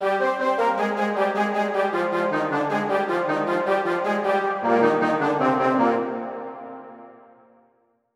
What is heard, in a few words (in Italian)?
Composizione per istrumenti ad arco e a fiato; con l'annotazione Konnte zuletz endigen